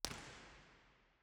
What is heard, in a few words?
First IR Sala 2800 Genelec Right BIN, Binaural; Santa Cecilia Roma; Measurements 2005; Soundfield